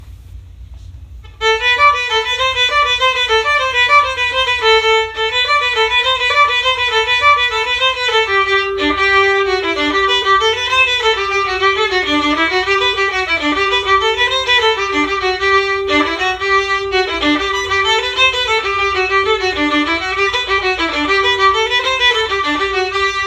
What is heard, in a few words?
Violin; christmas concert practice clips